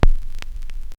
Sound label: vinyl needle drop onto re